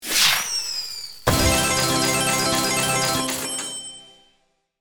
Slot machine casino golden jackpot win

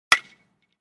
Baseball hit aluminium bat

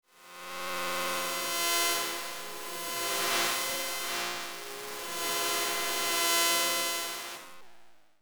Robot vacuum electromagnetic textures

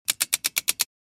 Safe vault dial lock turning click; gears

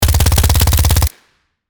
Commando submachine gun short burst

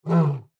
Lion deep breath

